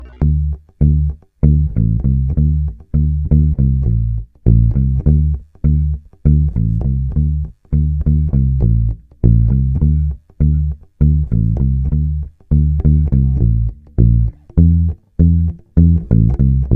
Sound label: Bass; Bass Cat; Rock Island; My Musical Cats; Android